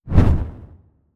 Druidesspassivecloth; Legend of Keepers (gamerip) (2019)

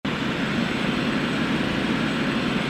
Walk; starbites

